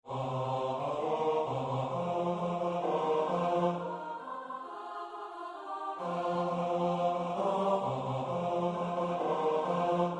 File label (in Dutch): dese coxkens bas midi; ons musyckboexken 2016